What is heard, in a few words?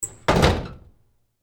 Cabinet Door Close, Metal, Large; Foley; Cabinet Doors; BlastWave